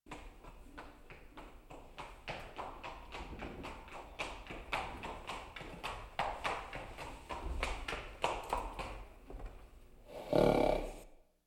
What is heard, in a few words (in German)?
auf- und abführen eines pferdes, Auf dem Land